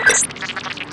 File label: command on; Tribes2